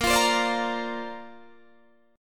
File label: A sharp Major Add 9th, Guitar Open D